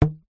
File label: household hairband ping